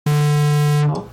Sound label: digital honk long